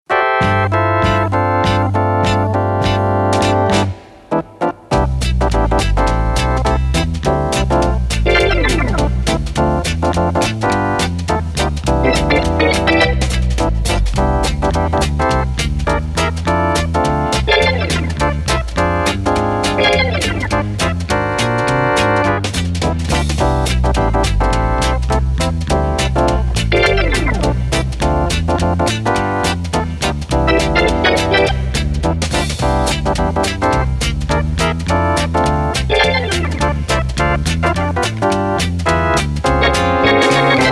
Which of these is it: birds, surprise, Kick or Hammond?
Hammond